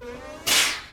18 Wheeler Air Brakes; Vehicles; Cars & Trucks

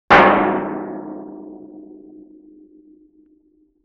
Oil Drum Hit Deep Sustain; Vintage Cartoon; Drums & Percussion

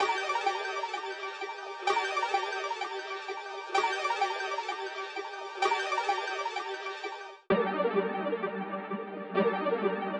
128 BPM G Min Arp, Analog; Melodies; Loop Stems; Cymatics; GHOST (Beta Pack); Archangel

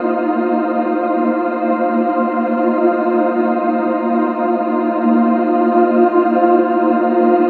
Atmospheric Loop Subtle Fmin 128, Melodic Techno; Ghosthack; AC2024 - Day 12 - Melodic Techno

